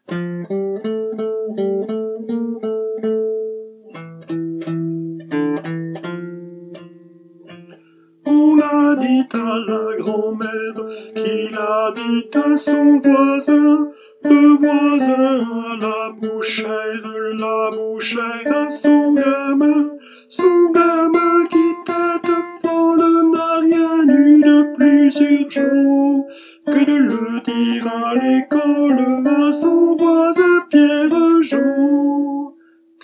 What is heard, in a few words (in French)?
tenor; repertoire chorale